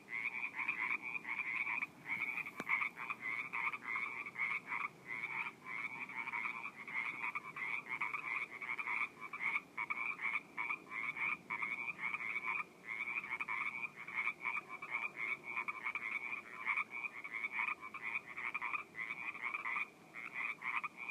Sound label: frogs in pond; at Wilder; Montanera Preserve; Orinda; Wilder Rd